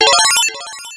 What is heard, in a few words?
Coin; Quarth